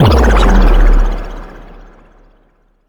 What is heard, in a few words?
spinning impact